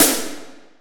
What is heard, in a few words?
Snare Verb EQ; Yamaha PSR E305